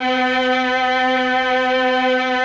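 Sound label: Mellotron strings C3; Greytsounds VOL-1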